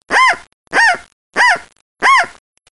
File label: pheasant call; upland birds